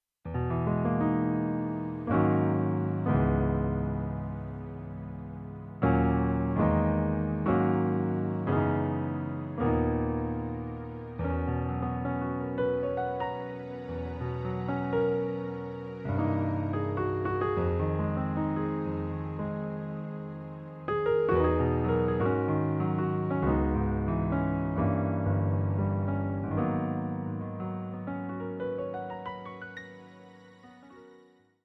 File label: Soft Music